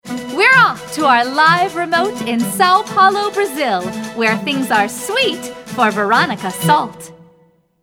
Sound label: News Flash Underscore